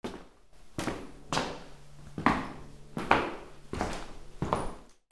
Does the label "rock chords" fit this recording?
No